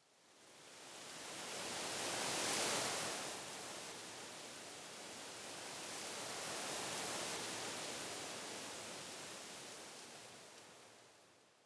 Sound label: Tree